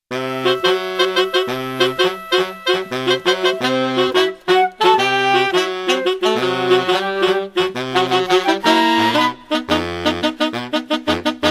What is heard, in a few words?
TV theme jazz band, Sax; oxfordshire